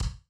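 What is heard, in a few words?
electronic bass drum; Best Service Hyper Dance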